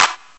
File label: clap one shot d key